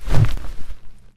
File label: z swipe, Zombies; zps; Attack